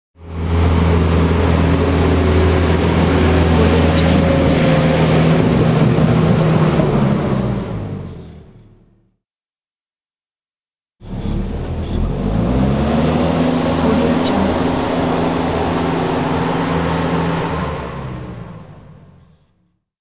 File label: small bulldozer pass by